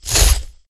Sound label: cut with sword; jidaigeki